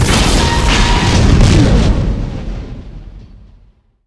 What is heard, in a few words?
explode; aw50